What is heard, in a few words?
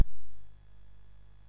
MOS6581R3, GAMES; Spikes Peak